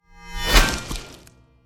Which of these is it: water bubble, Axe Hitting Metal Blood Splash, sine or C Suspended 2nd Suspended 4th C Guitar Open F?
Axe Hitting Metal Blood Splash